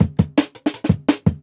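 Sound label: Jungle Breaks, 168 bpm; Original Jungle Breaks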